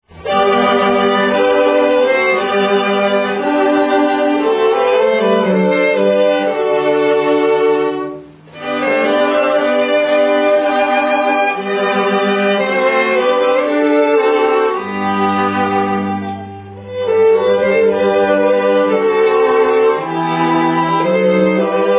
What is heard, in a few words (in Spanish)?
cuerdas; coros solistas; haendel